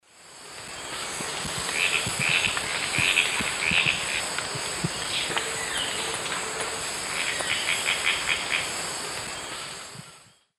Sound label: Tityra cayana, calls; S. America; Eastern Lowlands